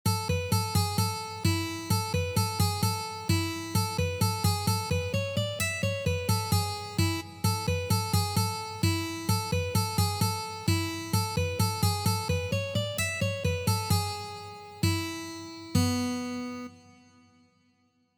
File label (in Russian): na odnoj strune